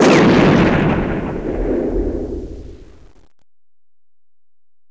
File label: weapon